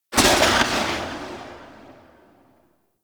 Fire 2D Tail, Rifles; Snipetron

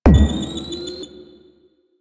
Alt Proj Pre Explosion, Blastor Pistol; Warframe; Weapons; Corpus; Plinx

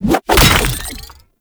Med Station Plant; Warframe; Lasrian Soldiers; Weapons

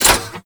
Rocket Launcher Fully Charged

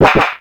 Shield Deflect; Hayden

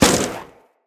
Fire Arrow Impact

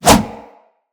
Melee Kick Whoosh; Weapons

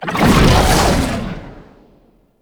Perfect Heavy Attack Activate, Weapons Melee

